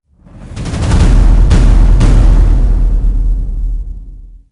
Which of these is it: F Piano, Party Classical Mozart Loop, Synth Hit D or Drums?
Drums